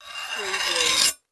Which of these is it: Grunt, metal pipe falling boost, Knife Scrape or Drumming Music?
Knife Scrape